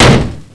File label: HIT POST; mtm2demo; PC Gamer 4.2